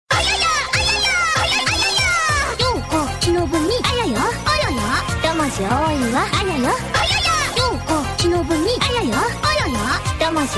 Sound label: ayaya